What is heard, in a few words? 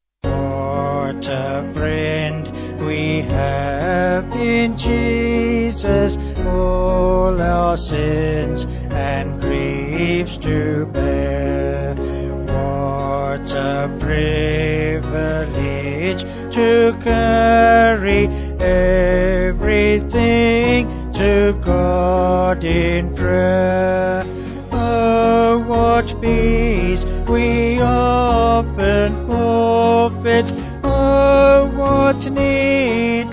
Vocals Band; What a Friend we have in Jesus, all our sins and griefs to bear!